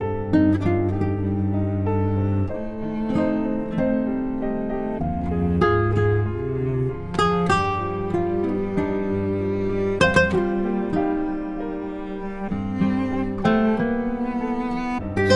привлекательный Фортепианная Музыка Медитировать

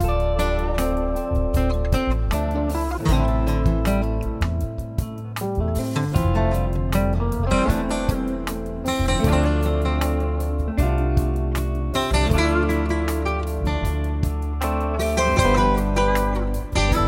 Отлично Музыка для учебы Фокусировка

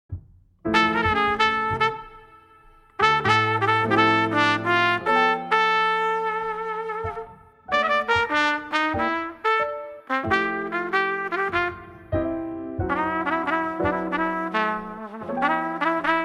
Известный Музыка для чтения Мечты

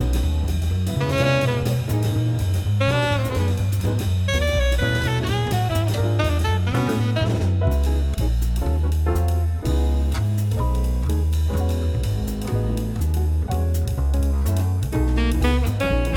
даунтемпо Музыка для чтения Атмосфера

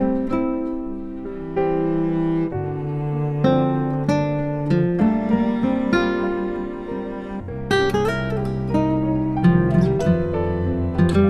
Спокойная гитарная музыка обольщение

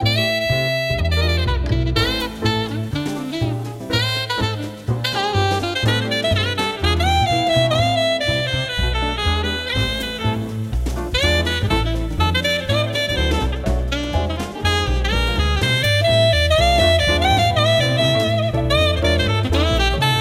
Музыка для чтения

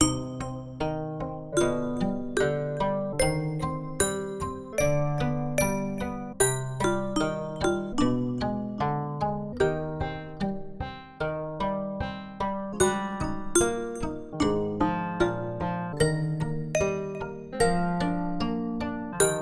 Музыка для медитации марочный Спокойствие

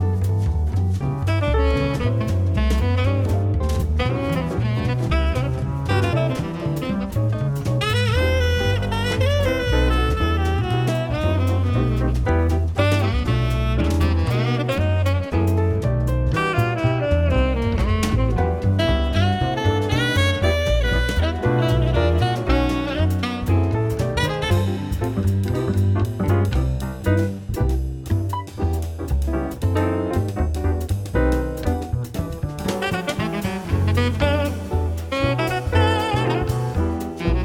Музыка для чтения атмосфера

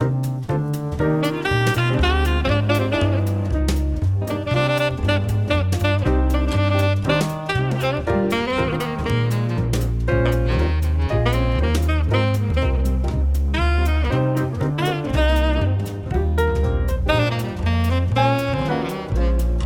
Музыка для чтения

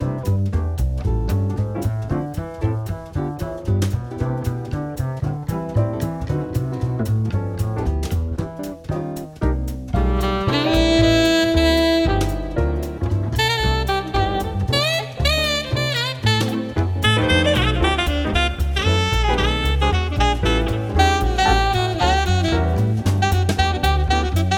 Музыка для чтения гостиная Атмосфера

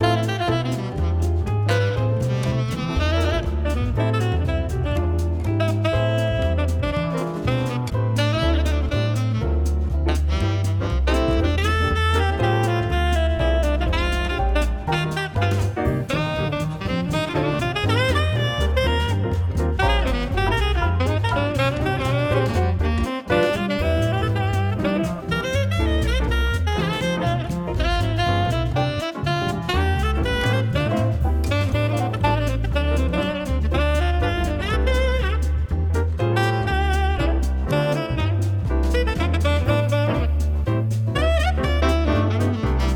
Музыка для чтения атмосфера

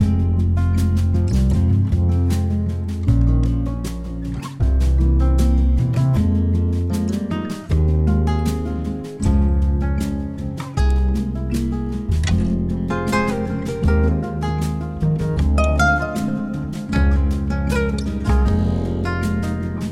Известный Музыка для чтения

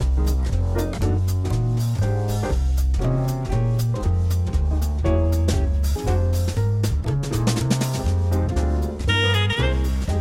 обаятельный Музыка для чтения Созерцая

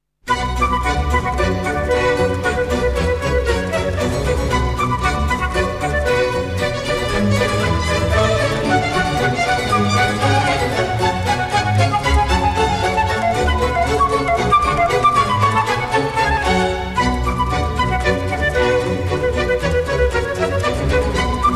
Badinerie, Сюита 2 си-минор для флейты с оркестром; Иоганн Себастьян Бах; Музыкальная шутка

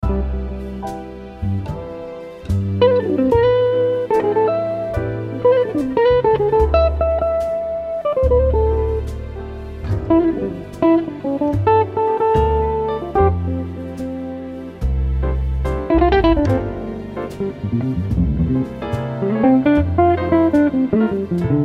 Музыка для чтения Настроения

